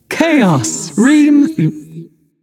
"yay"; smite; voicepack; nuwa

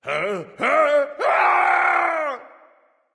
nv fear, vox; smite; Vulcan

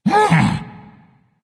surprise; smite; voicepack; kuzenbo

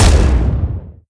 punch; smite; cabrakan

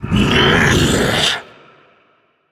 ugh; Smite; cabrakan

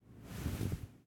armor; smite; anhur skin13

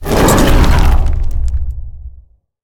earthquake; smite; geb

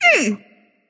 tongue; smite; neith

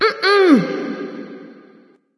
disagree light; smite; voicepack; hel

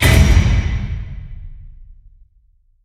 impact metal big; Smite; Cabrakan

